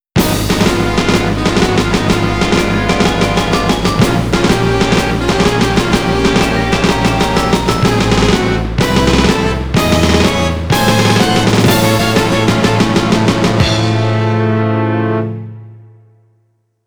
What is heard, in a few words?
MISSION FAILED [GAME OVER] (GS Arenji Baajon); Namco Sound Museum from X68000